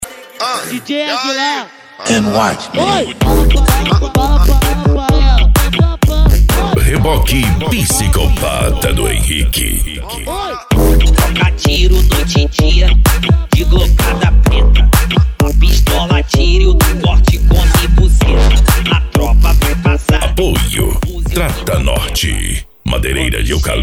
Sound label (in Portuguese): eletro funk